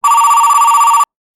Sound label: phone ring